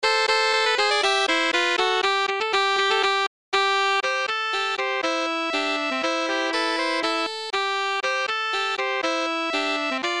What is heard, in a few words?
Illinois Loyalty - Sax Trio